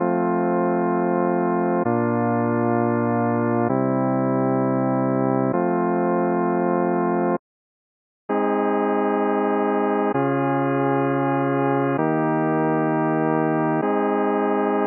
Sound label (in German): c dur as dur mitteltoenig